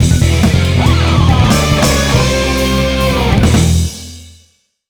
Mission Failed; Airforce Delta Strike; ps2

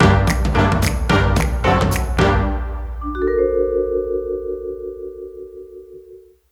Lose

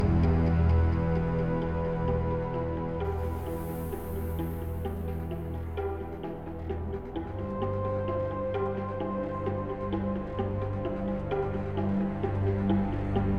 Main Menue Loop

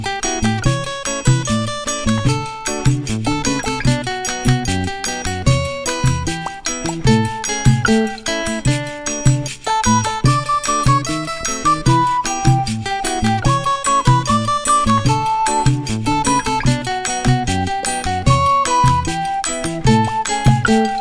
MUSIC MAIN; Junior Classic Games 3D

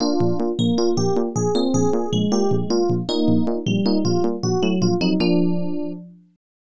Unused BGM

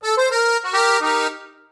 Squeezebox Riff; Levels Hub Bayou 03 Music Riverboat

